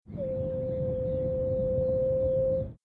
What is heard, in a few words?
sarothrura elegans, birds calls; rallidae